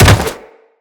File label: Jump Attack, Footsteps; Wall Run; Characters; Tenno